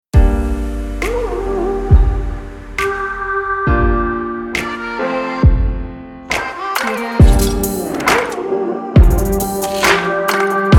slow motion

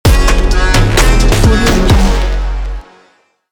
trap hiphop logo

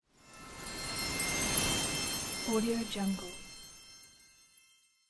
christmas wind chimes

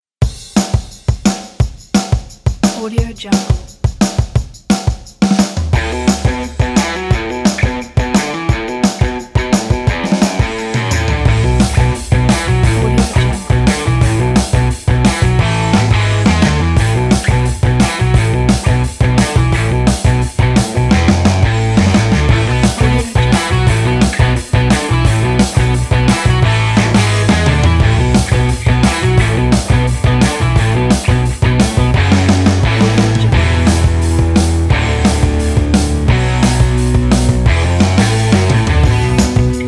rock standard 70s

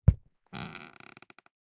footstep wood boots resonate dry interior

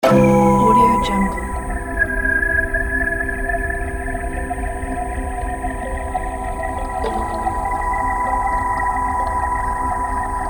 mystery sound; pavel-sleptsov

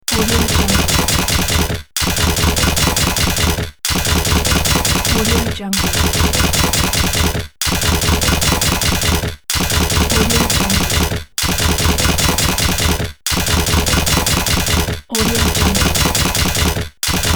fantasy machine at work

